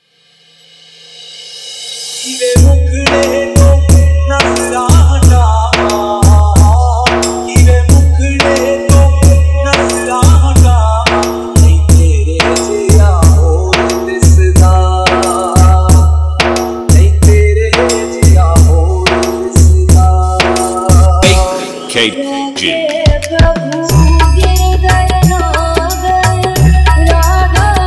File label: Hindi Dj Song